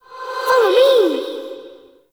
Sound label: Globelight Ghost Line; Warframe; Zariman